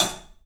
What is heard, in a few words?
HAT, 3-D Percussives; E-MU Producer Series Vol. 5 – 3-D Audio Collection; P.A. Kit